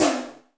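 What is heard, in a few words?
tom drum, right; Best Service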